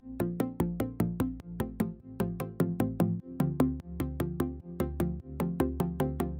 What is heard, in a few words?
Synths